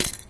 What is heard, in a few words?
ball buster catch; tf